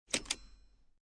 Car Interior Seatbelt Unfasten, Car Interior, 1996 Acura Integra, Seatbelt Unfasten, No Engine; Transportation Automobiles